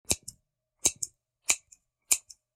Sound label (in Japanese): ハサミで切る音